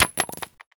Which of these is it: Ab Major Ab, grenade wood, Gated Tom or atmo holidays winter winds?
grenade wood